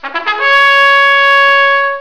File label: trumpet; firearms